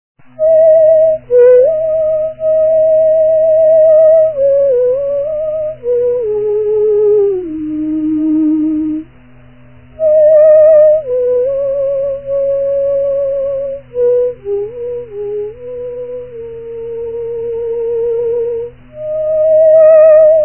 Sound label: theremin